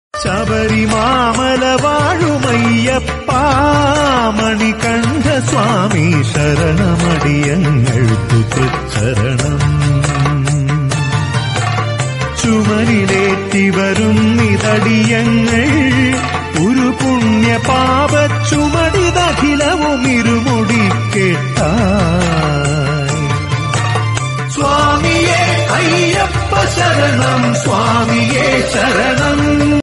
song, ayyappa swami bhakti

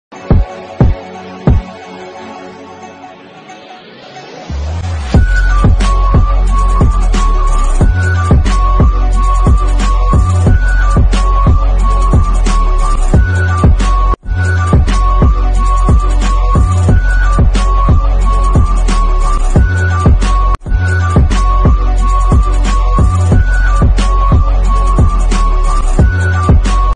English Ringtone BGM

